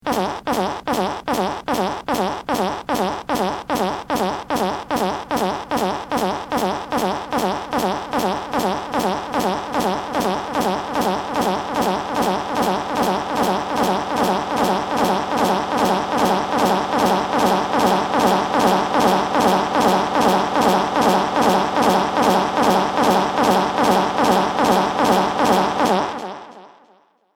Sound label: fart